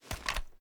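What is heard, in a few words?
sniper reload fast end; gmod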